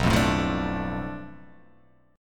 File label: B Minor 7th Flat 5th B, chord; Bm7b5; strummed; x,2,3,2,5,4,4,x; JGuitar